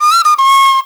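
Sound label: PENNY WHISTLE; Spectrasonics; Heart of Africa 2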